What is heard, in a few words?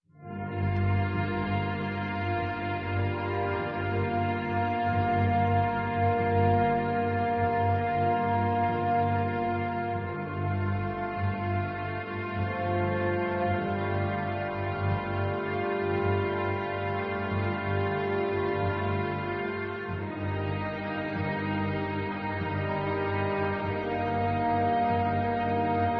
strings tragic ending, Sad Loops